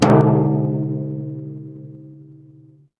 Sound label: instrument gong